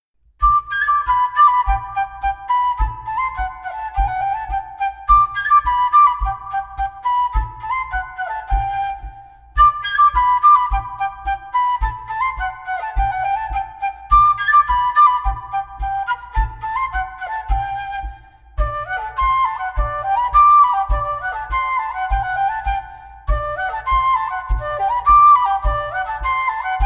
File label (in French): Montagnarde Bourree; Souvenir d Auvergne